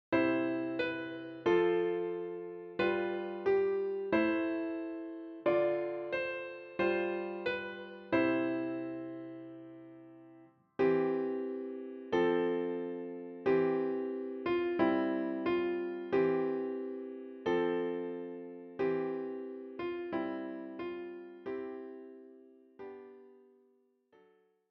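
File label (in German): O du fröhliche, Klavier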